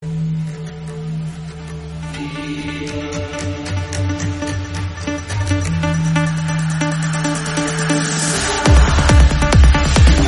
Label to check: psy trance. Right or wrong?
Right